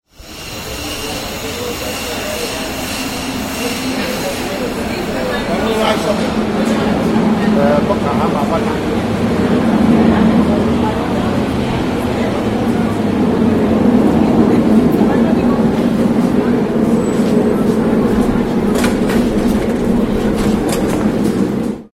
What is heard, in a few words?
metro frankfurt; fieldrecs